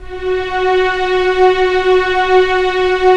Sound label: STR, STRING; Roland - LA Composer vol. 1